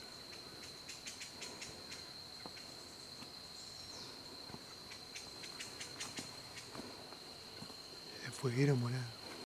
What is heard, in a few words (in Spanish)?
fueguero morado; Habia rubica